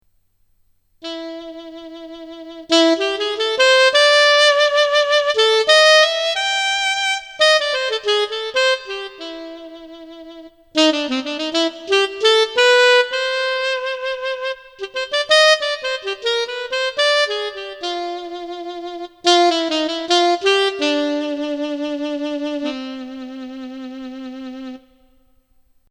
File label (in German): DP33 Sopran Sax